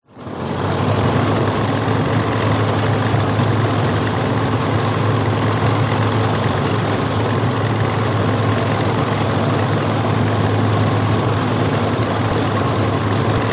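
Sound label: tank matilda mark 2 1939 drive across field slow to stop onboard exterior track; tracks close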